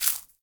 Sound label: step leaf; DeadFright